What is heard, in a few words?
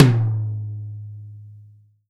tom, Real Drum Kit, funk kit